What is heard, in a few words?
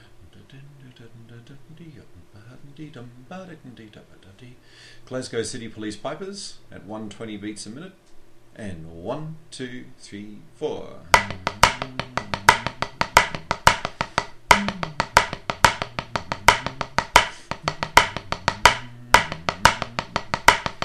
Pipers Beginner Score